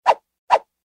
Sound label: move